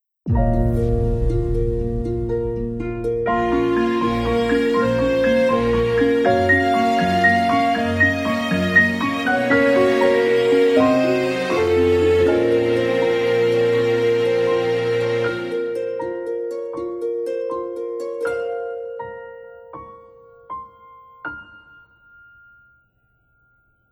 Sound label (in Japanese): ゲームオーバー